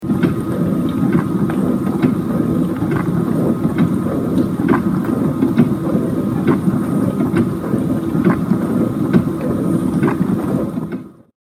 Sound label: rock